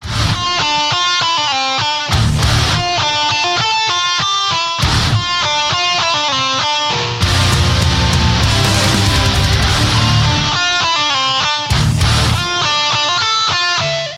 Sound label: best electric guitar ringtones; khong loi